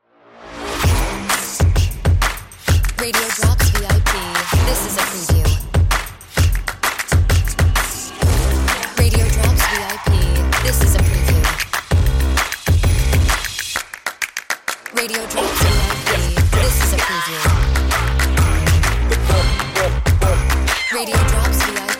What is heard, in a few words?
Music Bed